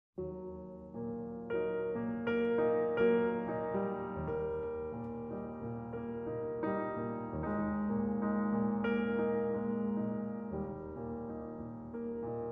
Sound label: Andante, Sonata for Cello and Piano in G minor